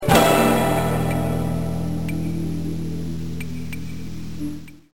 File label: hit